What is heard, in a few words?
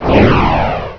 craps openup; Boxcars Casino